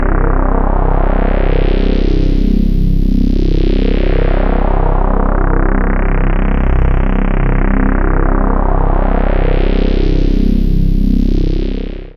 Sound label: 2 osc saw lfo Note